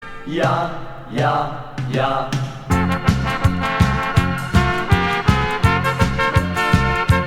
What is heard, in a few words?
polka